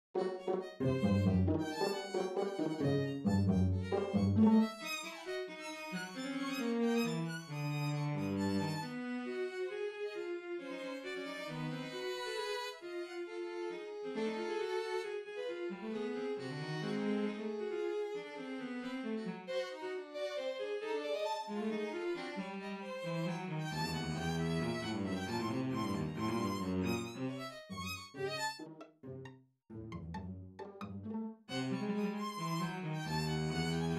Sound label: violin and cello; Arizona State University’s Katzin Concert Hall